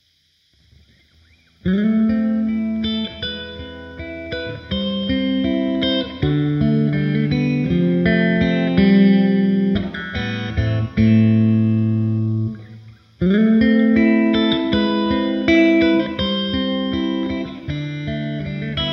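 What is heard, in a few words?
Fender Twin, guitar pickups; humbucker; classic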